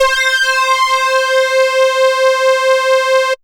single-synth, techno, c; Techno ID

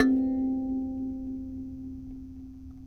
Thumb Piano, World Percussion One Shots; Sony CS

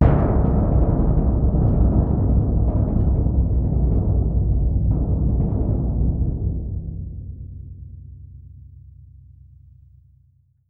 BD2, Percussion; Miroslav Vitous - Percussion & Harp

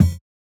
Kick, jungle breaks drum sounds; ORIGINAL JUNGLE BREAKS

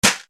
SD, Yamaha RY-30; ROUTE

